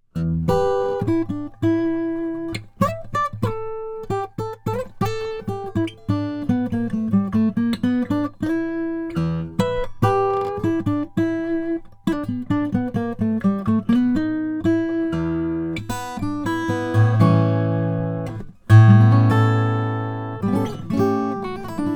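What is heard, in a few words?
froggy bottom r 14 deluxe mahogany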